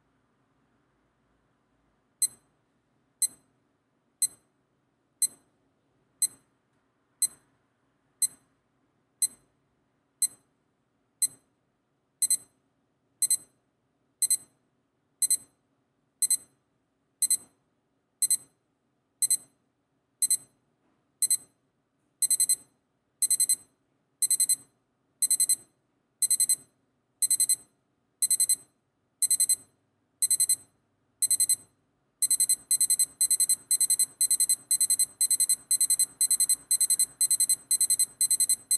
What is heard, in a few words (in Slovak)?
ukazka zvuku zosilnujuceho alarmu; Digitálna meteostanica s mobilným teplotným senzorom; MPM Drolly; MPM-Quality